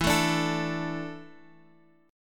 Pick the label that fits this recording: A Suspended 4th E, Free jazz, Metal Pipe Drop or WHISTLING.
A Suspended 4th E